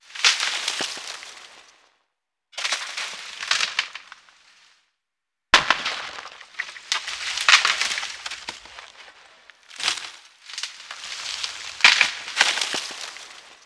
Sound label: twig snap snow fall; Call of Duty Modern Warfare